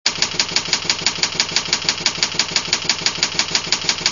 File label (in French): battement tac tac rapide